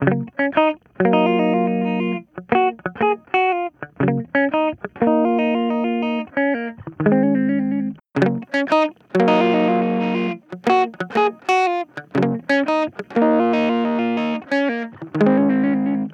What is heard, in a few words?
guitar drive